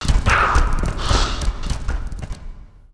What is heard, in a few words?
alien run by